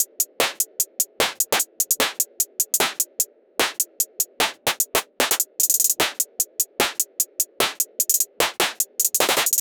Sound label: urban pulse drop trap